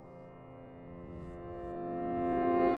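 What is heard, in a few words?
piano Room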